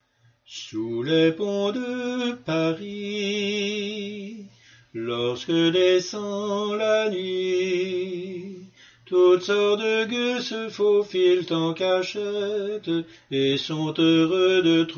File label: soprano; sous ponts paris